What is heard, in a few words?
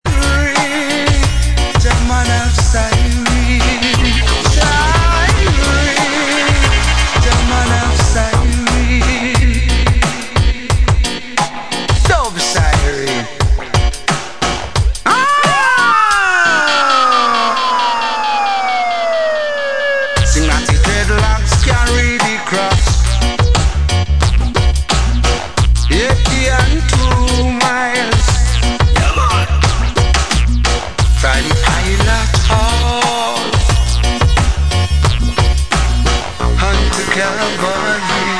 siren